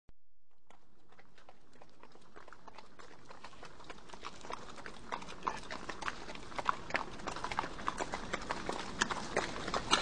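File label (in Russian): проход лошадей слышно сбрую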